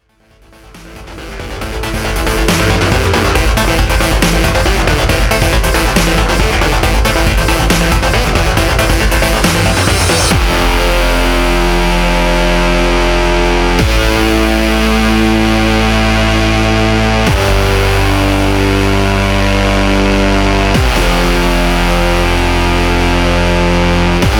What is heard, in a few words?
overdrive